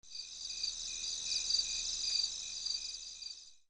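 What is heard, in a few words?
sverchki kuznechiki, crickets grasshoppers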